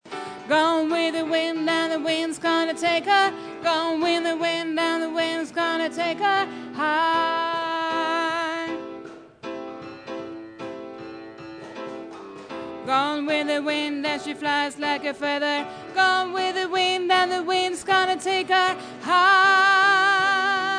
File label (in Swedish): bas